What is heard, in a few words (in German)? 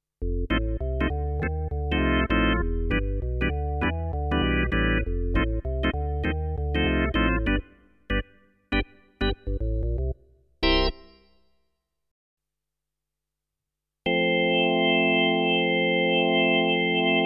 Drawbar Orgel